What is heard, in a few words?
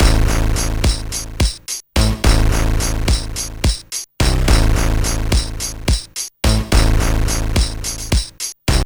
beatbox; Pinball Dreams